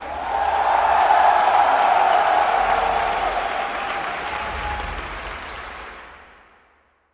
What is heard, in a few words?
cheer; radiant; dota; spectator